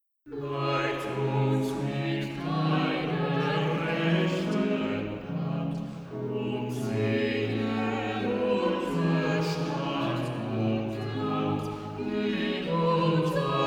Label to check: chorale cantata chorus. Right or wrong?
Right